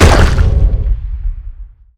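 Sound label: Poison Damage; Poision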